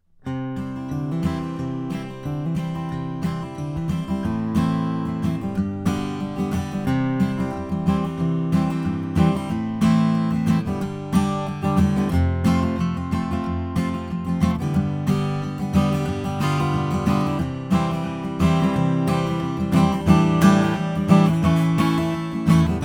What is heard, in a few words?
taylor style 1 figured mahogany